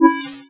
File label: signal; NetStumbler